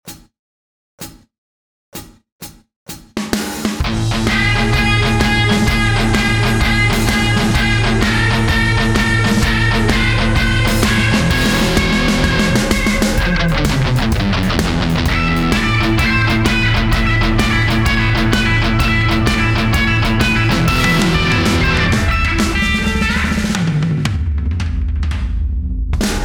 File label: Wow Meow Meow Meow